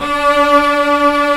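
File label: Cello A; AKAI S-Series CD-ROM Sound Library VOL-2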